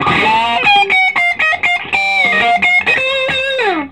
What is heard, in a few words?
SOLO, Vintage Blues Guitar